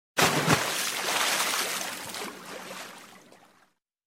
large water splash

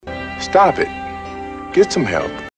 hard moan

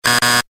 buzzbuzz

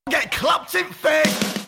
clapped; stay strapped or get clapped meme

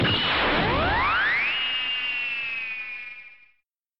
kamehameha wave sound effect

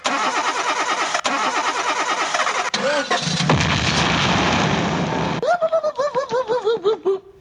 car boom

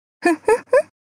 lute giggle

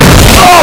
patchy falls loud

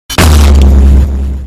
death sound effect; kakyoin

